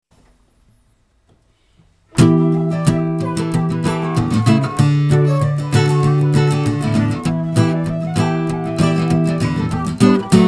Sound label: Guitar; Tarbolton (Reg)